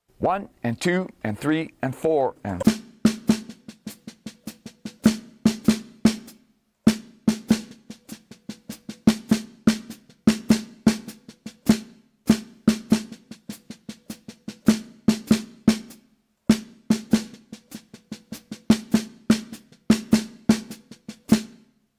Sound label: Melody Triplets